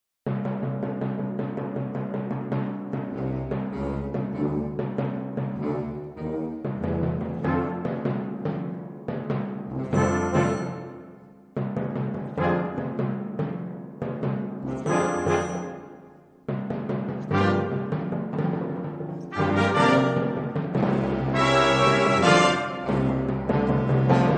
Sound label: three little pieces for percussion